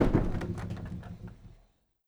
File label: door large wood movement stop; Rust